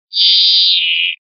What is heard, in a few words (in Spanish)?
rascada, verderon